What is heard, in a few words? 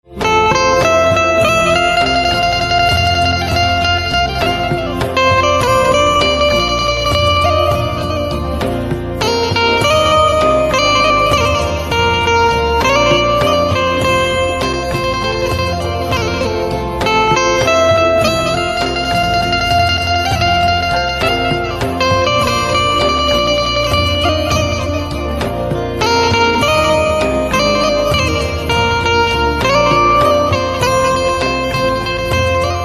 Banjo Instrumental Ringtone